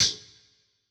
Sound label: Tech Drum Hit, techno 120bpm